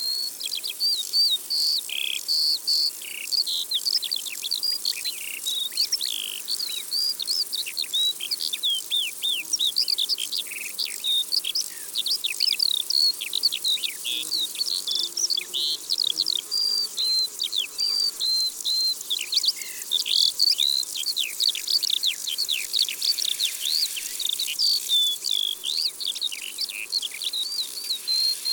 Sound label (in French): Alouette des champs, Concerts naturels de l'Isere; Studio Les Trois Becs